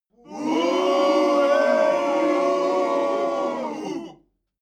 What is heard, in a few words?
male crowd booing short